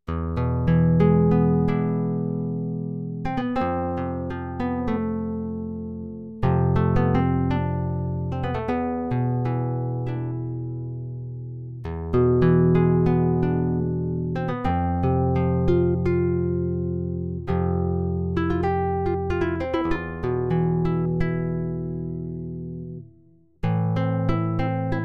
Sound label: Nylon guitar